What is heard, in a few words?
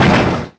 Bang; DS; NEWSMB